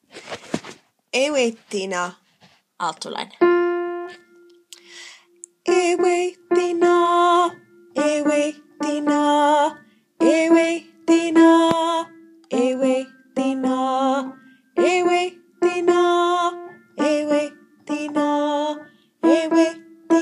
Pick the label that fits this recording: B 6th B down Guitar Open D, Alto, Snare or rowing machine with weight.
Alto